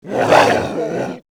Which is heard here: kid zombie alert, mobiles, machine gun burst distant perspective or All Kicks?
kid zombie alert